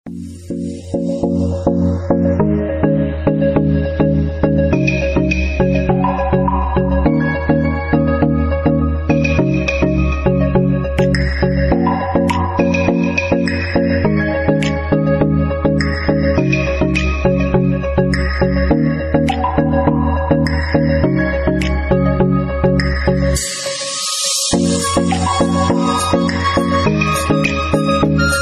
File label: cool musical tone ringtone